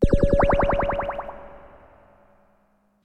down; Monster girl quest Part1,2,3; Visual Novels

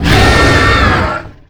cursed sword; Fate Undiscovered Realms

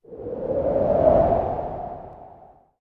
wind; Monster girl quest Part1,2,3; Visual Novels

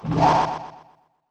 stamina, spells; Fate The Cursed King

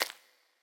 footsteps mudroot; Fate The Cursed King

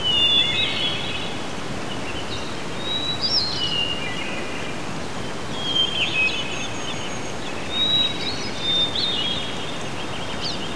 woods; Fate

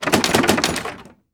locked, items; Fate The Cursed King

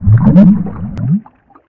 jelly step; Fate The Cursed King; MONSTERS; GEL

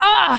male death; Fate The Traitor Soul

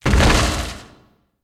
volcano door open; Fate The Cursed King

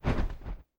flap, Phoenix; Fate The Cursed King; MONSTERS

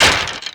hit, skeleton; Fate; MONSTERS

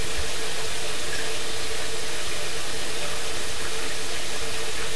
shower; Monster girl quest; Slime

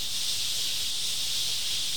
gas; Monster girl quest Part1,2,3; Visual Novels

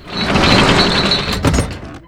chain door close; Fate The Traitor Soul

